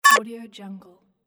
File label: advanced tech discard